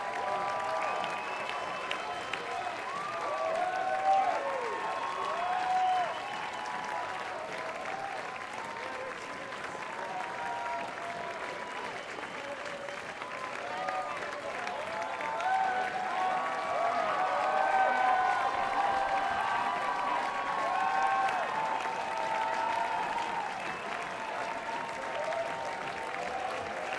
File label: Crowd